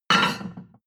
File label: plate on plate place slide hard